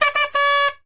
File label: Horn Funny Party